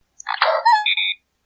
Wiimote Sound; Olimar

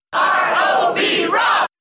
Cheer NTSC; R.O.B.; Brawl

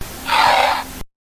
Wiimote Sound; Donkey Kong

